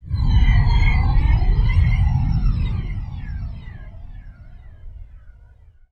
Jetpass, Flyers; Zero-G Seismic Frequencies

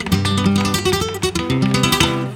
GUIT SOLO; Best Service; World Colours

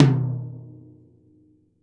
TOM, DRM KIT; Jazzistic

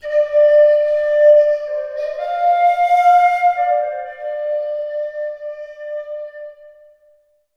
Tropical Flute; Sonic Foundry (Sony Creative Software); Ma Ja Le Ethereal Textures; Flutes

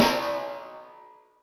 S RuberS, Electric Snare, Yamaha DTXpress

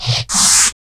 CYBER KIT, DRUMS

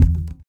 EQ Perc, Percussion Mix

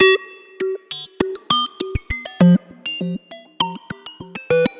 Robo Beat 100BPM, sci-fi Beats

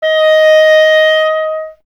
clarinet D#, Solo Instruments; Miroslav Vitous - Solo Instruments VOL-1; 90 s Sample CDs

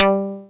BASS DECAY

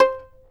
fiddle pizz C5 L; Sampleheads; Whole Lotta Country 1 & 2

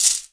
perc shake

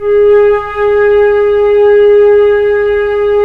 FLT FL, Flute; Roland - LA Composer vol. 4

